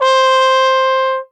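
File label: trumpet C hi mute plunger closed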